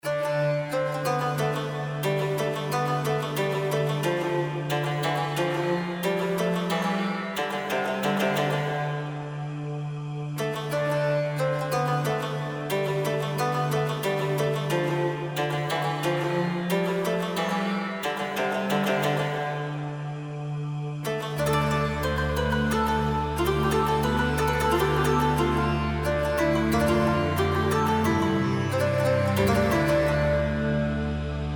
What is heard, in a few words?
koto